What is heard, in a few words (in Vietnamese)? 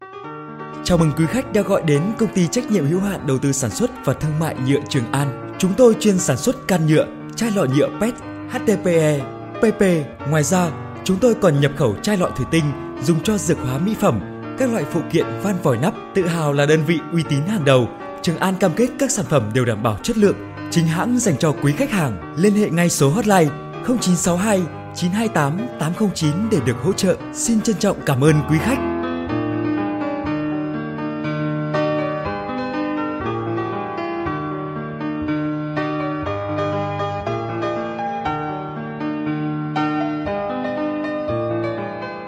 NHAC CHO DOANH NGHIEP; NHUA TRUONG AN